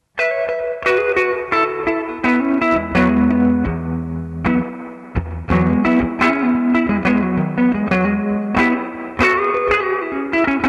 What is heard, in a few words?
broadcaster